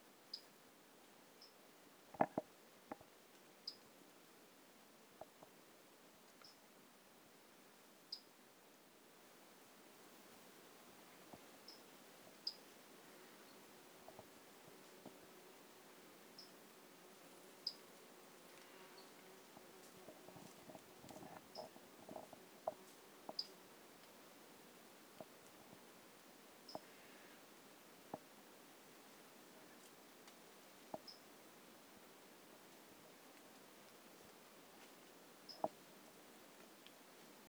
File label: Brachycephalus tridactylus individual and individual; LF Ribeiro